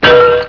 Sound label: clunk; Three Stooges